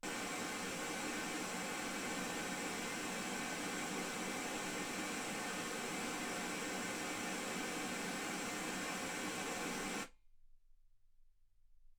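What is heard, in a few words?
white gaussian noise 48kHz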